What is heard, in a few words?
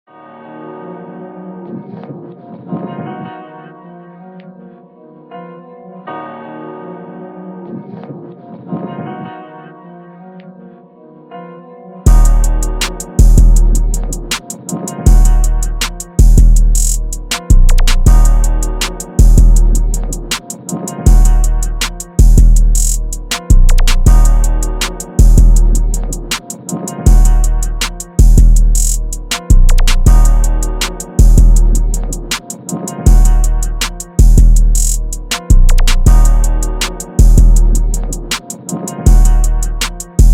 Instrumental